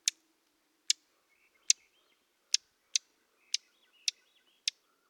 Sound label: Dark-eyed Junco (Slate-colored) Calls